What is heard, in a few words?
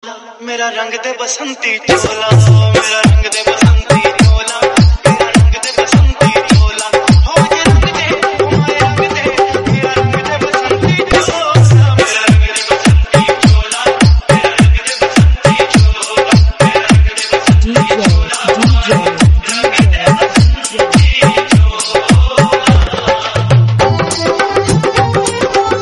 Desh Bhakti